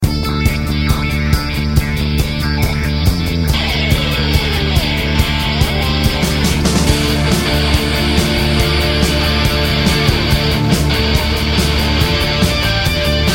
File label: rock guitars